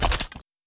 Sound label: rifle clip in, weapons; br85